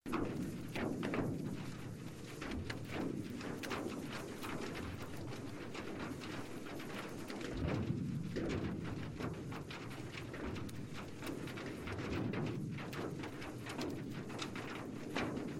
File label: Audification Earthquake